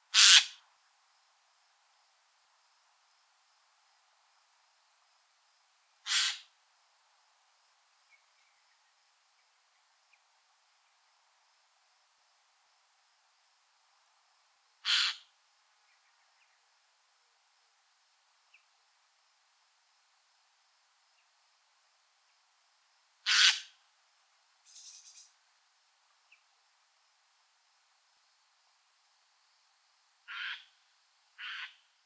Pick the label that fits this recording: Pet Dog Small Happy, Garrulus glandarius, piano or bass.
Garrulus glandarius